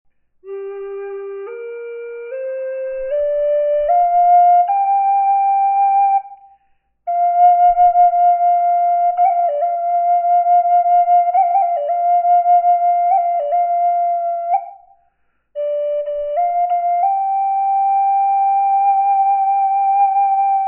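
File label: reverb, flute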